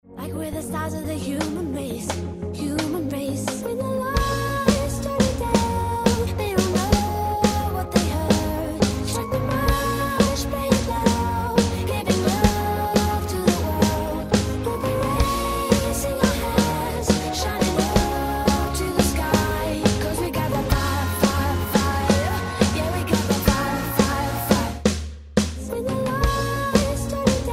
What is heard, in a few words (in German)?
PreChorus mit Musik